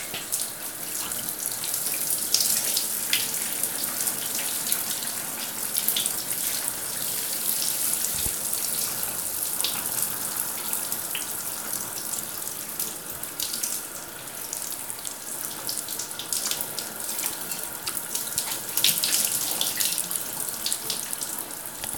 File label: Shower